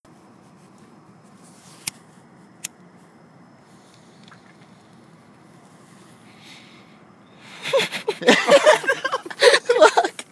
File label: Lighter